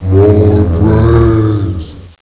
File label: ROAM; ghouls forest2; bzomb